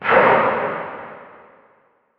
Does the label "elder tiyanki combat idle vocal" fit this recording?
Yes